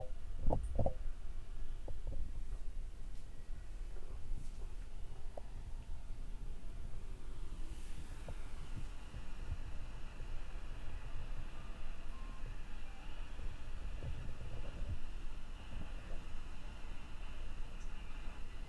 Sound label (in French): conduit d aeration, appartement